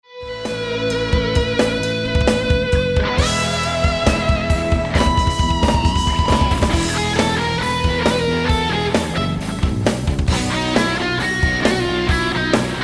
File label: soloist